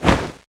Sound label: Flap; Flycaller